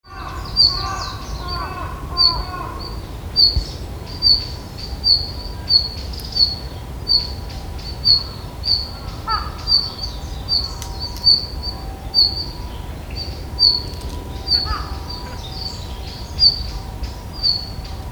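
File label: ruribitaki, red-flanked bluetail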